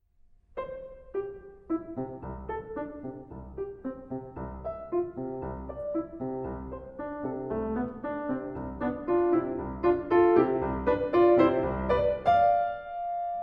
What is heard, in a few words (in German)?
Sonate